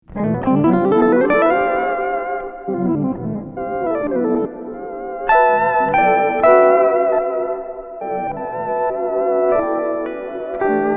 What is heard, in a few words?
Fender Jazzmaster; Rainbow Machine V2